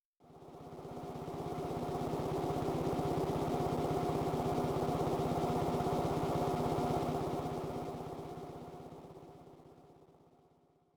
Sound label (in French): moteur inserts